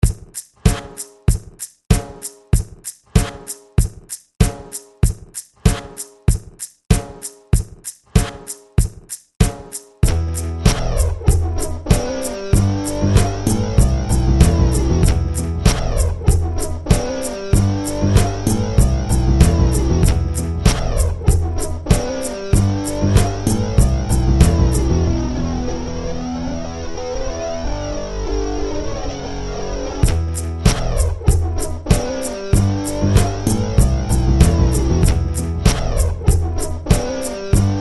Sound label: Riji Lute; grey leonard